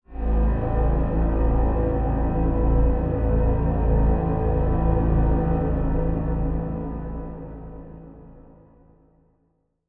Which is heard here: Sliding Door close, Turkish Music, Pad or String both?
Pad